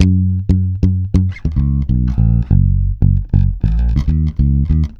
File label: FUNKY WEST F#, Bass, 96 BPM; Ultra Freakin' Bass; Sampleheads